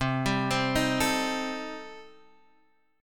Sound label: C Minor 6th, chord